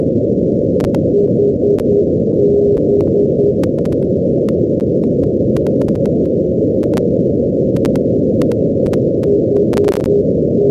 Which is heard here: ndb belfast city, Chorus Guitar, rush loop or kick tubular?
ndb belfast city